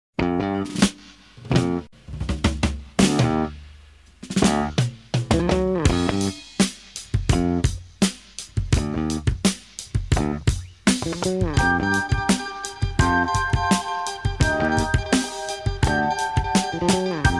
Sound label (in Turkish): alla beni pulla beni zil sesi; meşhur şarkısının giriş bölümü tam zil sesi olmaya uygundu